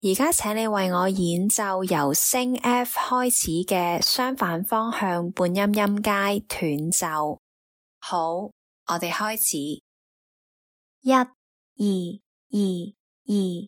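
chromatic contrary motion scale starting F sharp staccato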